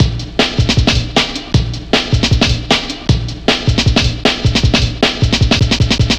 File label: 155, Drum Loops, Drum & Bass; Total Drum&Bass